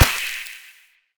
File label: yoyo